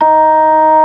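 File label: ORG. D#4; Best Service; Advanced Media Trax Modern Composer; B3 FULL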